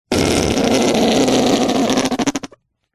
whoopee cushion squeeze fart long rasp weak at end